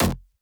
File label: Psytrance Pluck F